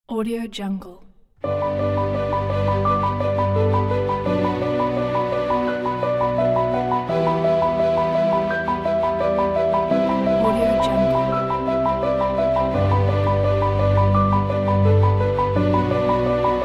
piano inspiration; skybirdsoundstudio

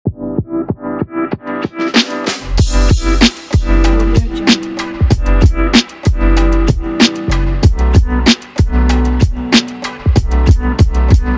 chill soul

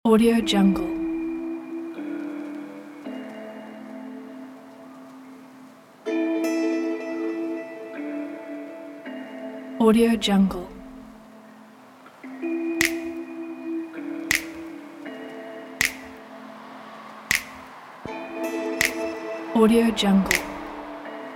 mellow lofi beat

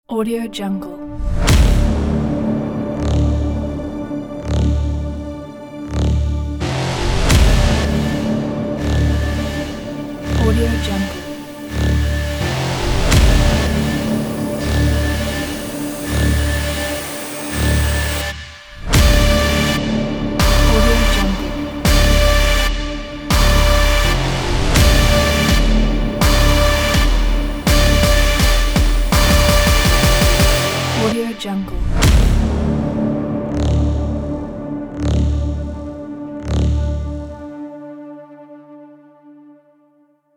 intense dark trailer intro

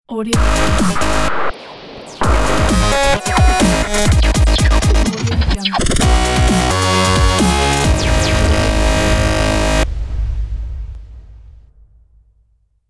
electro loading logo

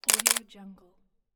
camera shutter twice

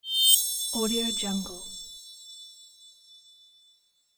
shining transition

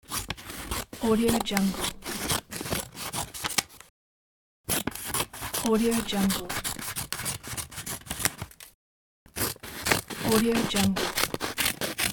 cutting paper

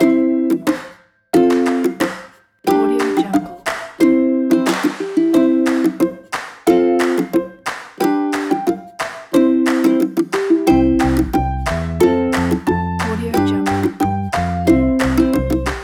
for children

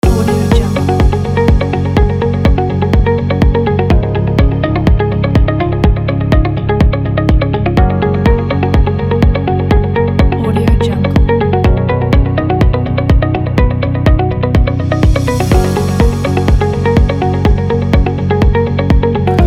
educational loop; stereo-color

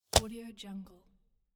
case open

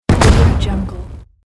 detonation

